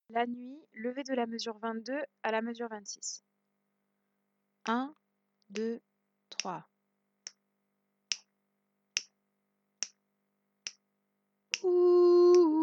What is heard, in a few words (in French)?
basse; la nuit; jp rameau